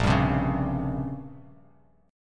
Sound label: Bb Minor 6th Add 9th, Bass Standard; 6,10,10,6; strummed; chord shape